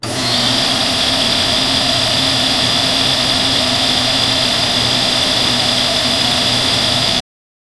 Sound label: small electric fan operat; air movement